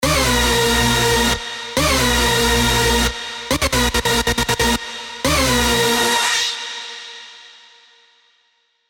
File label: Stab; Stab Level 99 S-Rank DJ; Revealed Serum 2 Big Room Techno Drops Vol. 4